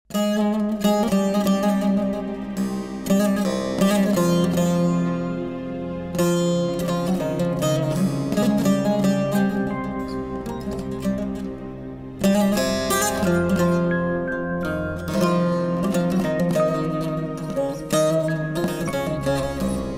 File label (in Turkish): serbest baglama